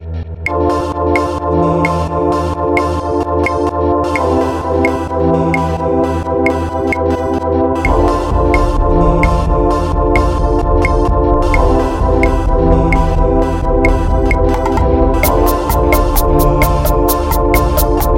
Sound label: Caribbean Disco Remix; FL Studio